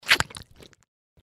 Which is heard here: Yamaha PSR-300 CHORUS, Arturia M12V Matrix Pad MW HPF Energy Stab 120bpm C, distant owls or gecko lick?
gecko lick